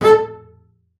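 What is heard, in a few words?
marcato A4 right, bass spiccato; Xsample VOL-5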